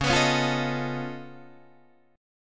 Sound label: Db Augmented 9th Db down Guitar Open D